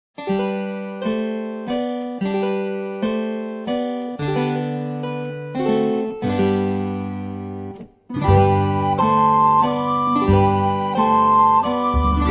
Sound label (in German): Menuett